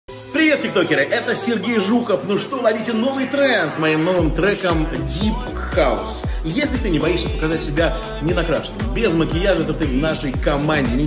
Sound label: deep house